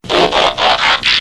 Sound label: Transform, Lock Chime; Transformers